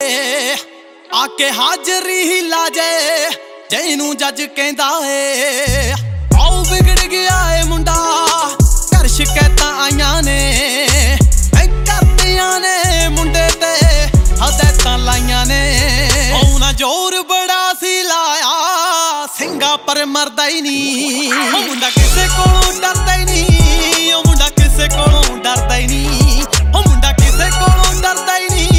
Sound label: Punjabi Ringtone